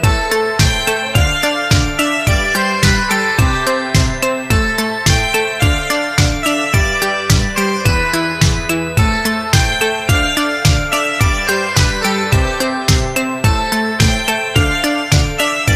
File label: iphone 80s disco ringtone